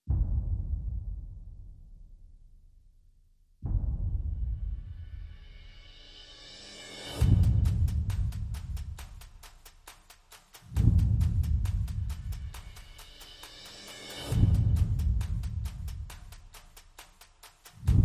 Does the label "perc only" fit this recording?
Yes